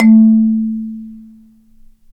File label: Marimba, -; Roland LCDP11; Africa VOL-1; Af.Marimbas